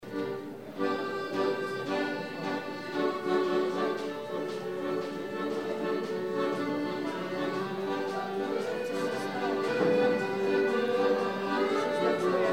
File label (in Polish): akordeon, pieśń